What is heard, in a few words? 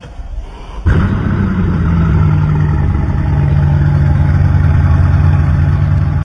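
sound of engine start supercar version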